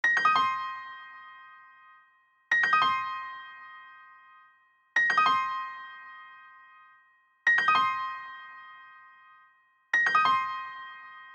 Hip Hop Piano Loop

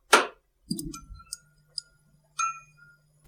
Fluorescent Lamp Start

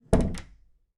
Door

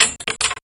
Metallic thuds

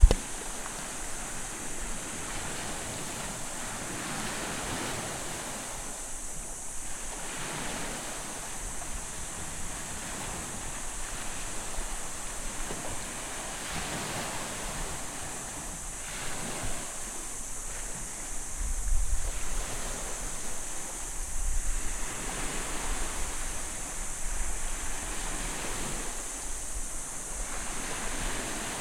Night; Kasanga

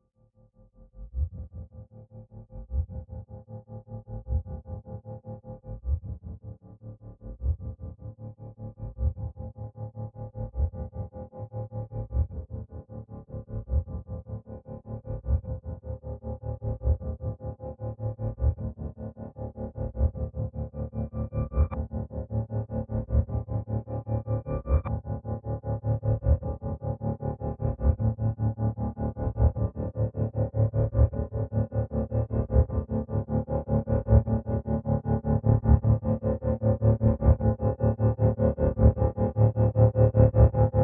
Ambient Build

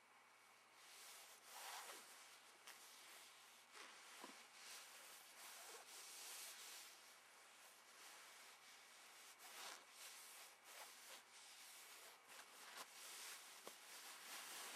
Fabric Rustle

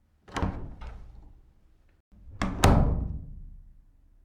Door Open Close Inside

